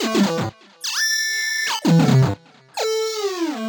130 A, uk garage, Synths; 130bpm Lines n Loops

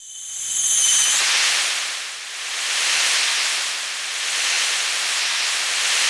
AQUA SPEED; Distored Reality

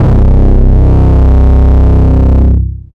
Growl Bass; Basses

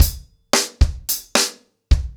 Hip Hop 110BPM, acoustic drums drumloops

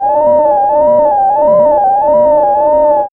SYN FX; X-Static Goldmine 1

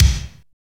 KIK, kicks; AMG; Now CD-ROM (Roland); NOW! Drums

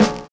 OLD SNARE; Univers Sons

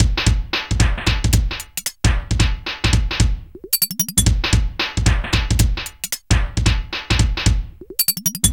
Drum Loops

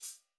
White Foot Slow, Hats; Airwindows

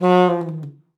sax f#3, Saxophone slides; Session Instruments; Best Service ProSamples vol.42

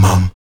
VOX MUM F#2E, Afro Chants, Stacc Mums; Roland LCDP11 Africa VOL-1

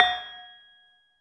LAMELS F4 -L; USB Soundscan vol.60; AKAI; LAMELOFO

